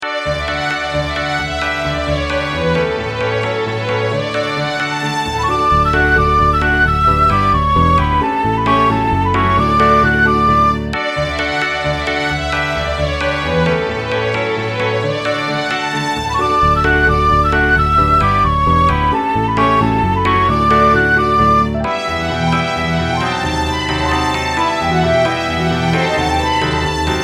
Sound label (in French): Courante